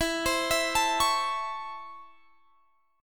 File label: C sharp Minor Major 7th Sharp 5th, chord